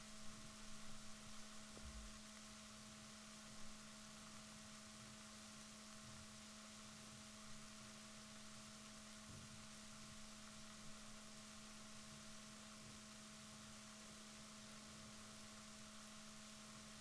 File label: rorquals negatif, Balaenoptera Physalus Chile